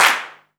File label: Claps & Snares